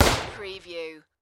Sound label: Rifle Sig-Sauer SSG